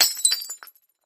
Kitchen Glass, kitchenware

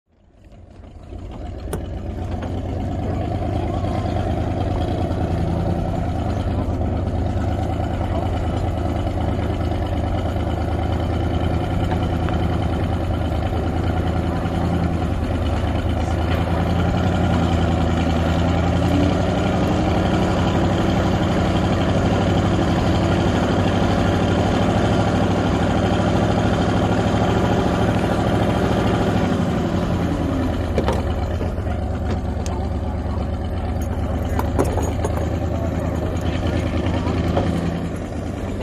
Boat Race Pit Doc Amb, motorboats

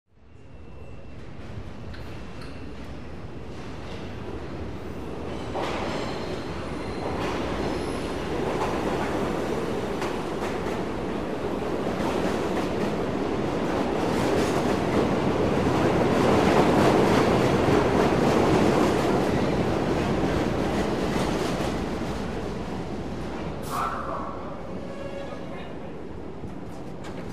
Board Exit Subway Tra

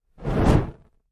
Torch Movement; torches

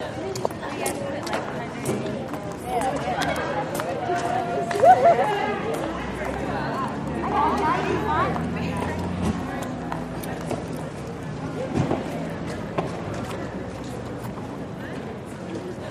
School Courtyard Int, crowds ext

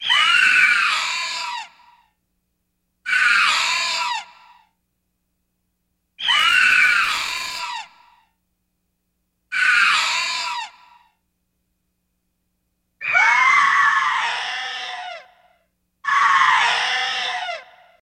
Zombie Death Cries; zombies

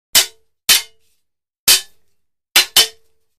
Swords; Metal Swords Hit. Five Times.

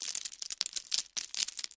Landscape Rock On Con, rocks & dirt

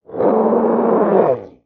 Animal Stinger Bull; stingers & whooshes

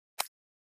Button Muffled Plast

